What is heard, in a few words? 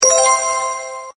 normal win; yule; cardtiger